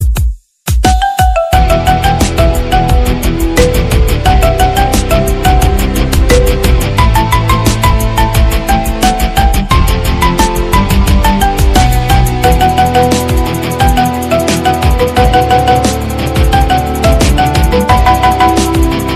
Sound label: Mobile Ringtone Piano